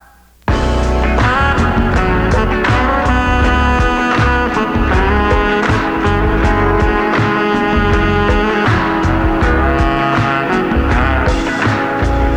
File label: Virtual Saxophone Transmissions